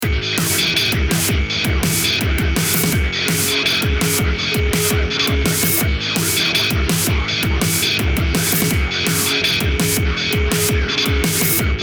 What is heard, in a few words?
beat; navy seal type